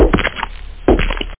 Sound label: footsteps